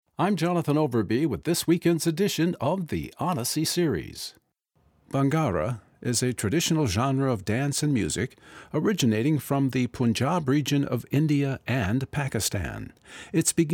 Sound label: bhangra dance music